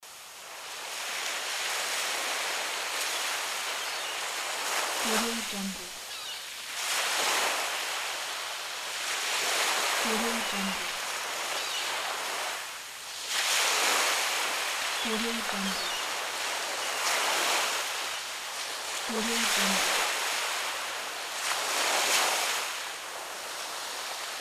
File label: summer sunset and seagull